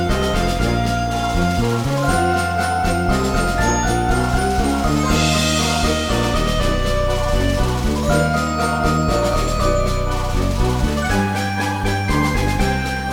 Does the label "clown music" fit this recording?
Yes